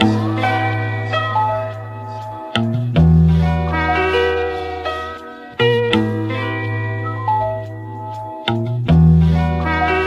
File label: keys, jazz loops